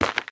Cave Footstep; The Inn

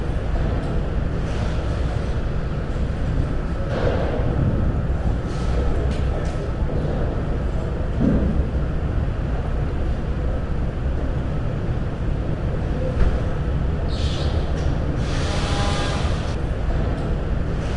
Industrial Stereo; Urban Trial Freestyle 2

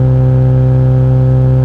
Ferrari Enzo Low; gamerip; Car Simulator 2; android

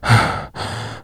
Fatigue Light; 1916 der unbekannte krieg gamerip

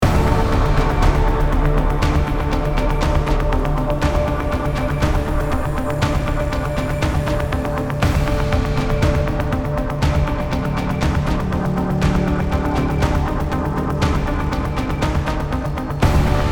Machinery Music Mix

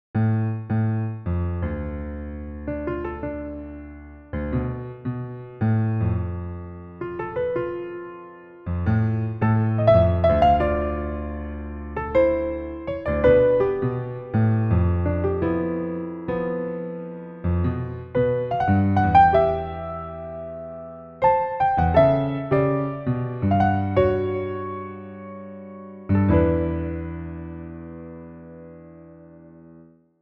Piano Solo; the legend of zelda majoras mask reborn 2023

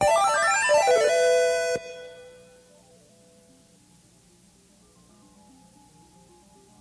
Interval; Heiyankyo Alien; cassette

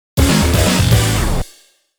Jingle 2 (Join)

